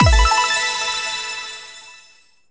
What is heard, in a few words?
MAP SONG CLEAR; maimai